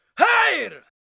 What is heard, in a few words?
Battle Cry, Viking Vocal, Un-Processed